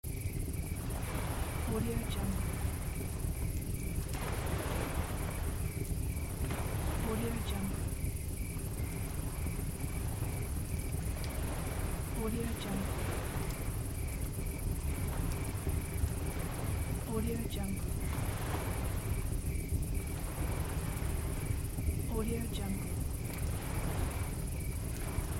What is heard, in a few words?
night sea picnic ambience